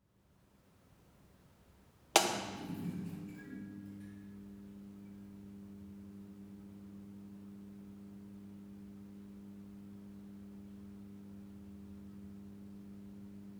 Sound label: School Classroom - Lights, Switching On, Middle Distance; Schoeps MK4; ORT; West Wolf; Quiet School Ambiences